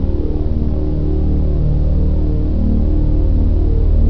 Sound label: song; lordscastle